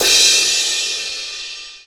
CRASH, drum; KITS-SMALL

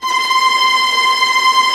Viola Trm C5; Digital Sound Factory Virtuoso

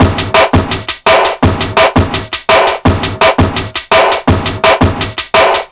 168 break, Jungle; USB Soundscan vol.05; Explosive Jungle; AKAI; 90s sample CD

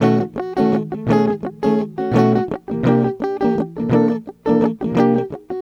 Chunk Guitar, Melodic Synth; Sonic Foundry; Fluid Dynamics Drum & Bass

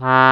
Concertina C2; Multisamples; Digital Sound Factory Planet Earth

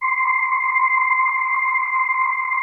SYN VOICE C4, ATMOSPHERICS; Music Store - Claudius Diemeter Schwerlos

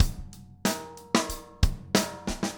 STRT, drum

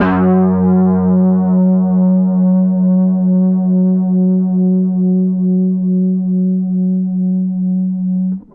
EFX LNG F#2, bass; Sampleheads; Ultra Freakin' Bass; EFXBASS LONG

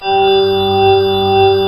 G4 ICICLE; NorthStar; The Wizard; WINTERS BRTH

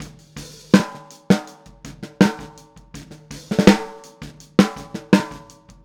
Boogaloo, Snare, 82 BPM; Drums; Verse; Sonic Foundry; Drums from the Big Room The Mixes

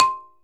Balafon C5; Multisamples; Digital Sound Factory Planet Earth

